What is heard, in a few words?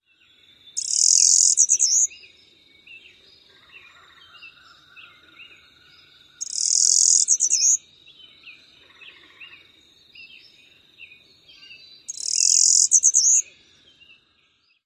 dawn song